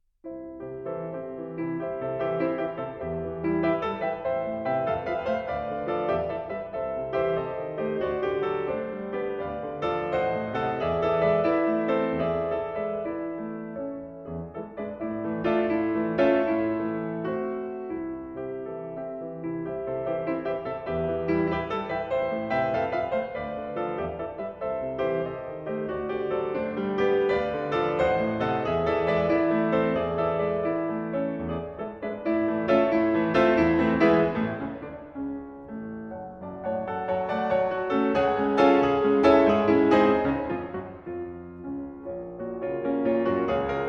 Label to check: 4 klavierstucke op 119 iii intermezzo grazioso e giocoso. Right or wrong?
Right